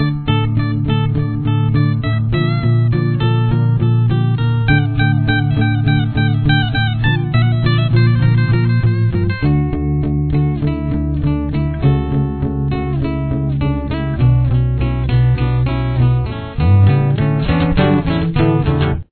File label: solo